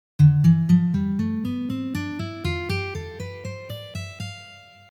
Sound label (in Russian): Ionijskij lad